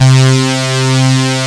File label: PANNER SYN, DANCE; Pro-Rec - Synthsonic; Z1 DANCE